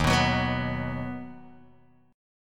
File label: D sharp Minor Sharp 5th D sharp down Guitar DADGAD